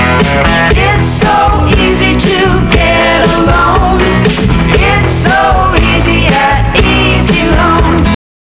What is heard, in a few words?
jingle